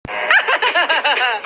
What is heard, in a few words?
hahaha